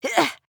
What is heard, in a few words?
Grunt Attack; Princess